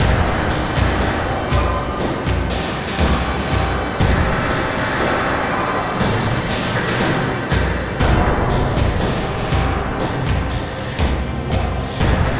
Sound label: Danger Tonal; Insanely Twisted Shadow Planet